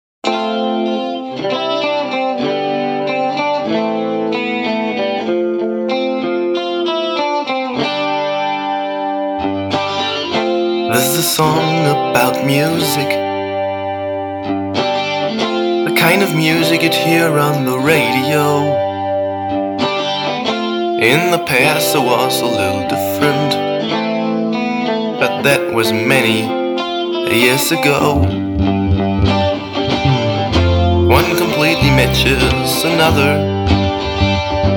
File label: song acoustic